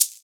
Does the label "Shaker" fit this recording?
Yes